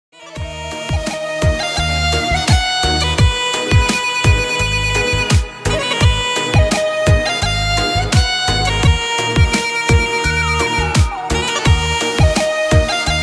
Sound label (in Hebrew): רינגטון